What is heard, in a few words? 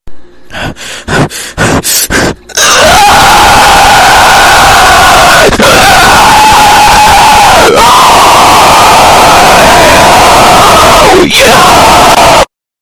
demon scream loud